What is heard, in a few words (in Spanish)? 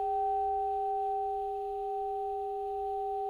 Multiarmonicos